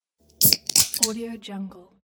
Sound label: beer can open